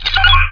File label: sound activate; MOTS